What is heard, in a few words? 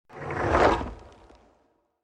glider launch; Out of Reach: Treasure Royale